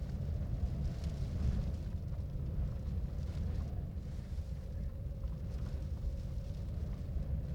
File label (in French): Chute libre; the pit game design